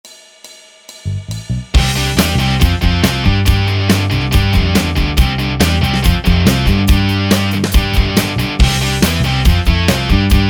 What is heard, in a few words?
Backup Track C Major